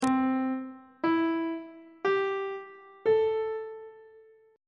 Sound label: c major 6th chord intervals on treble clef